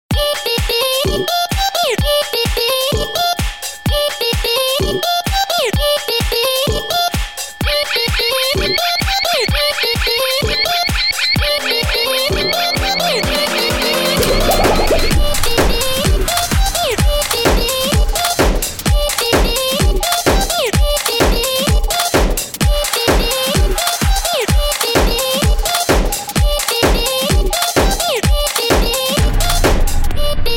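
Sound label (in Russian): ритмичная смешная со звуком отрыжки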